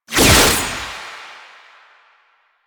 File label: Throw; Kuva Lich Finisher Parazon; Warframe; Finishers